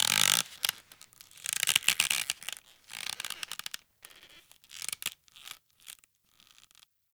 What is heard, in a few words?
Rope Creak Hang; Warframe; Ambience; Grineer; Carnival; Carnival Ride Scares